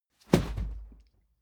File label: whoosh cloth fast hard; swooshes-swishes